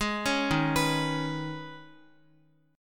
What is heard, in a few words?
Eb 7th Suspended 4th Sharp 5th, Guitar; Eb7sus4#5; arpeggiated